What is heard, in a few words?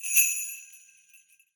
Sleigh Bells; World Percussion; Cymatics; Emperor World Percussion